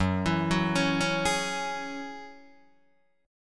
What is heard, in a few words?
Gb 7th Suspended 2nd Gb Guitar Open D